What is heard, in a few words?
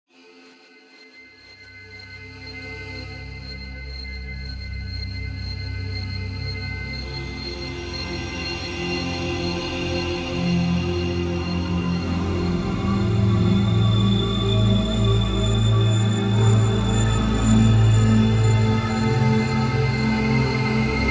dronar metal and gla; starfield